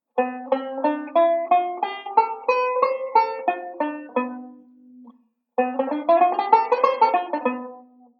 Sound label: Modal Sequence; Beyond Chord Melody Volume II